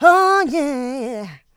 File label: Vocals, Adlibs; Houseworx